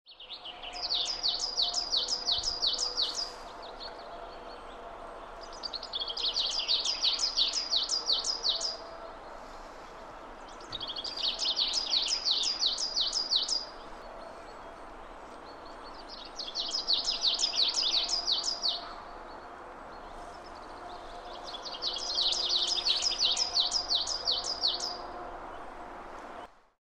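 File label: coal tit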